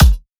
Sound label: kick, drum hits; Anthemic